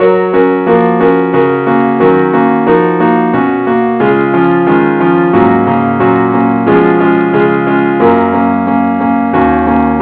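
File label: keyboard only, key F sharp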